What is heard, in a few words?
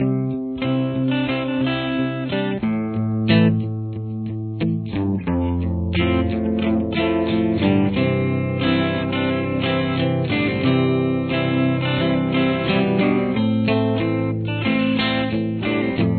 fullchorus, guitar